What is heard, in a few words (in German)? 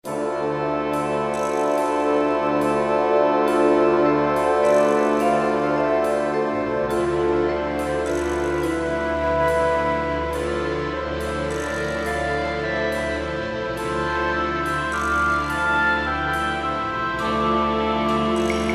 Saxophon-Konzert